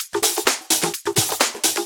Houseloops 128BPM; Vengeance Samples

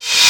Cymbals Reverse; Vengeance Samples

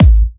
128BPM Dubwise Kick; VFH1 128BPM Dubwise Kit

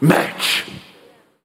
Vocal Phrases; Vengeance Samples; Vocals

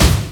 Tom, Percussion; Vengeance Samples

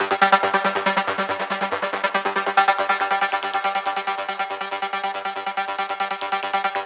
Root G 140 BPM; Vengeance Samples; Special Sounds

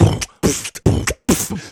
Beatbox Loops, Human Beatbox

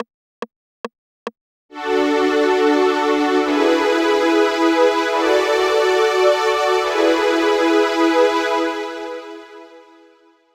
String Bridge (open), 142 BPM; Vengeance Samples; Hello You

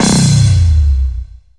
VFX2 Sub

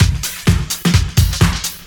Housebeats, 128BPM; Vengeance Samples; VEH2 Loops

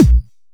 Kicks; Vengeance Samples

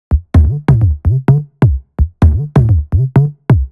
128BPM Air Raid Melody; VFH1 128BPM Air Raid Kit

